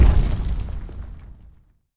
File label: Destroy Boulder